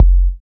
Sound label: boom